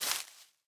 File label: Leaf litter place; Ściółka; Zniszczono blok; Gra gdy zniszczono blok